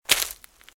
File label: Footsteps Leaves Walk Right; RadwinIsland